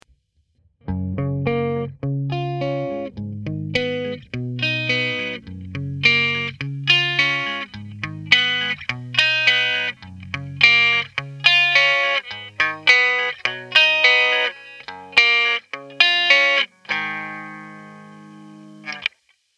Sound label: E Guitar Picking Custom EQ